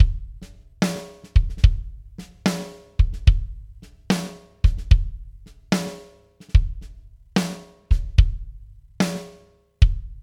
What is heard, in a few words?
dbx 676 kick snare tom eq